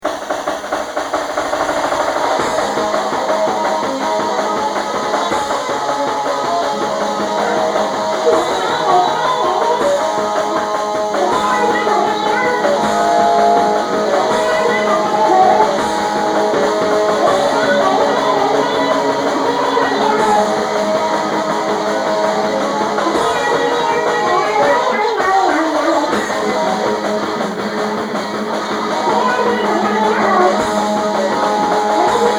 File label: Rock'n Roll; SURGE!!